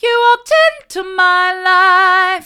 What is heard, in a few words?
mid, vocal